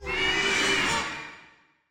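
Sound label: Homunculus Skinning Death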